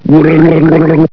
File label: gurgle